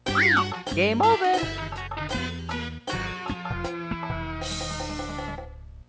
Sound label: game over